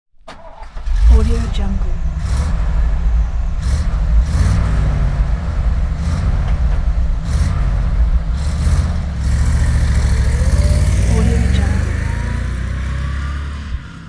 tow truck start revs and away; hollywoodedge

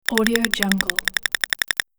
data clicker

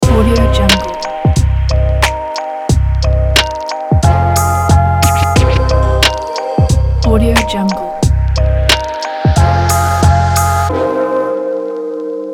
podcast vlog opener

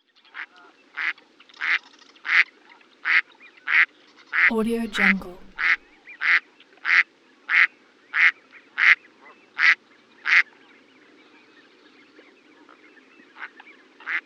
mallard duck quacks; hollywoodedge

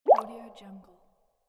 drop

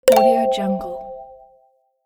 the correct answer

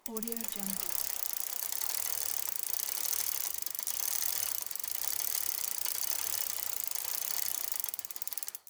bicycle gears

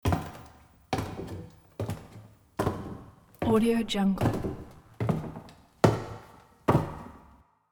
footsteps wood stairs

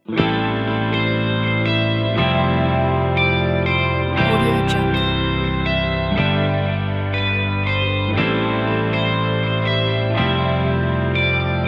inspiring corporate

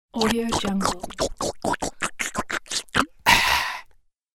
cartoon fast awful eating; fxprosound

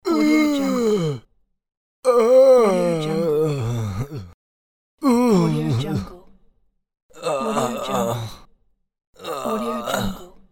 male in pain

